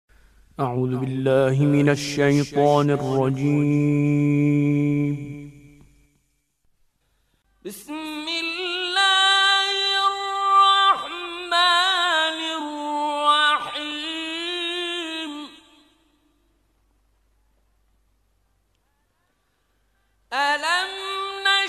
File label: Mujawwad; Mansouri